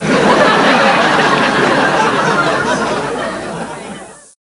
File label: spec Laugh